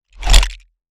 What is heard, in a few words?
multi brick whoosh; build mode